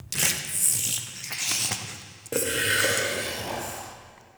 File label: Interior Squish Gurgle, Infested, Ambience; Misc Indoor